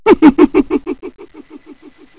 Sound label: hm hm hm